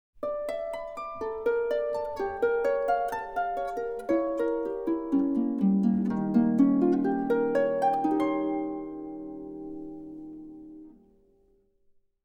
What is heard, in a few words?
Mystery Harp; Dragon Quest VI; Symphonic Suite Dragon Quest